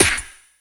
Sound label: PERC, Drum & Bass, 180 BPM; Ueberschall; Drum & Bass Flash Trackz